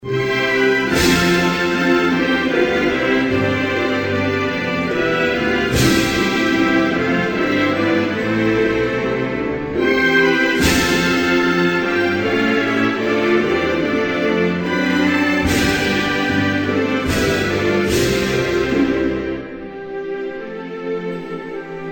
Gambie, Hymnes nationaux

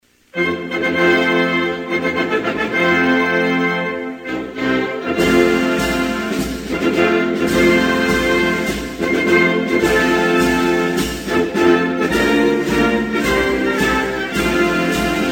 Bahrein, Hymnes nationaux

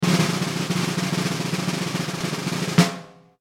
Roulement tambour; Cirque

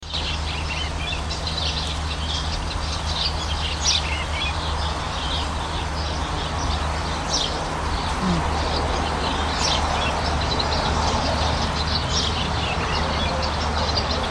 Bois en banlieue

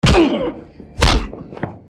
Coup de poing

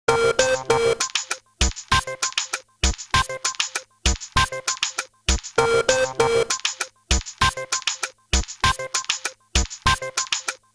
Comedie minilist; Jingles medley

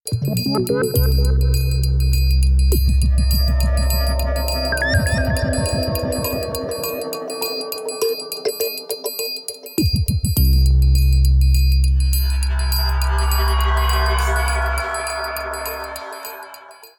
Futur jingle; Jingles medley

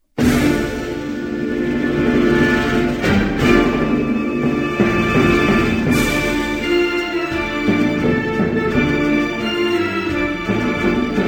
Azerbaidjan, Hymnes nationaux

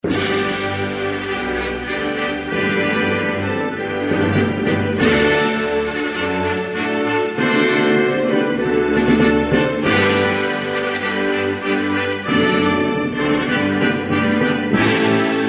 Lettonie, Hymnes nationaux

